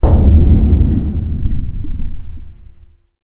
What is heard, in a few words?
game over; Mahjong Medley